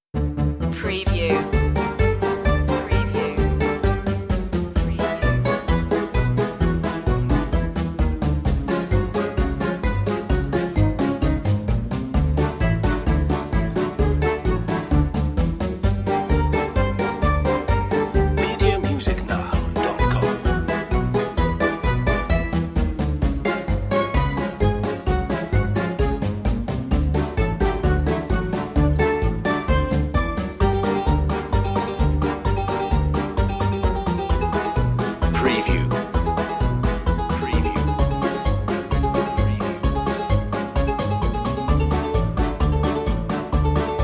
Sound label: quirky comedy